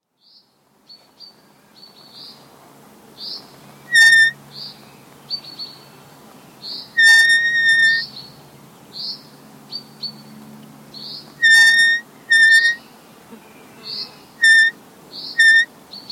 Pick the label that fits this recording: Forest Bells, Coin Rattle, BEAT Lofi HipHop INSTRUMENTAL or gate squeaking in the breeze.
gate squeaking in the breeze